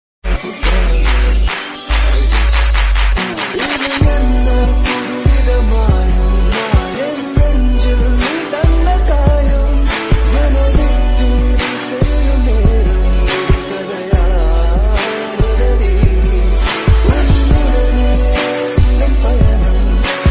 best bgm ringtone tamil ringtone telugu love bgm ringtone new tamil ringtone